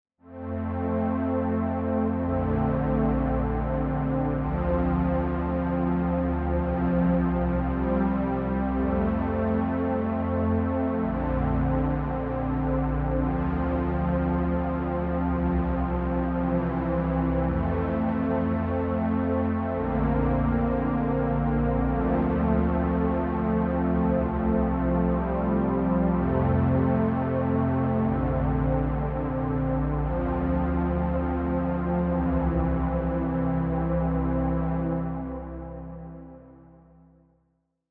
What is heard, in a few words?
Modulated Pad